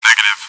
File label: negative hit